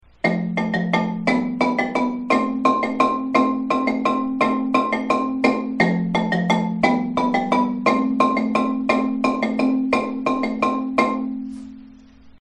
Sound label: pre chorus, marimba